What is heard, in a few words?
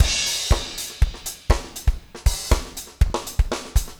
4 on floor 120, loops, 120bpm